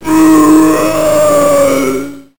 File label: zomb moan